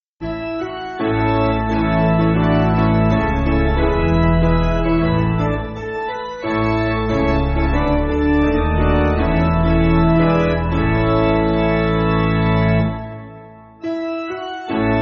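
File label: Piano, Music Backing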